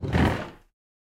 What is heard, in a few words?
library furniture footrest